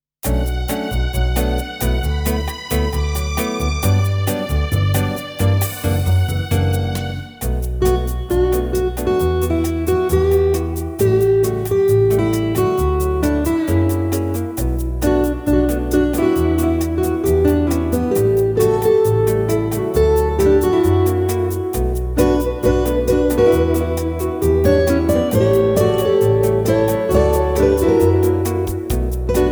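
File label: 3 Guitars in canon, Three Classical Guitars playing in canon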